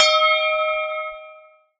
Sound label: Cymbal